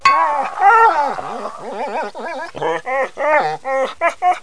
trex; GEOGRAFIA; tatuzin

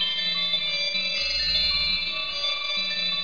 C bell

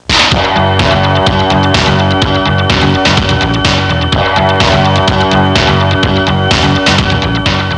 modern rock bassline; Music Intros

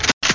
reload

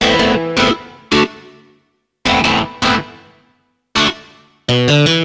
Synt; FUNKIN&GROOVIN'

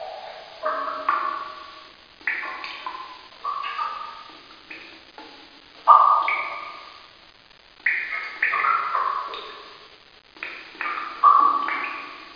drippy; duke

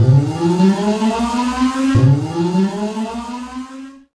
Critical Stop; 250 Desktop Themes; Quake II

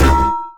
Twang; orbital

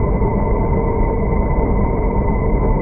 warp engine; Earth and Beyond; EA Games

